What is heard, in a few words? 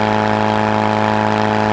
Loop, Engine, buggy